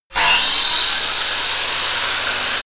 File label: grinder bright start free